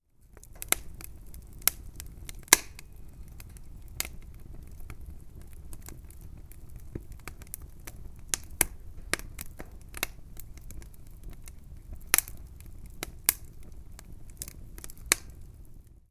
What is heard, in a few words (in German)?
Feuer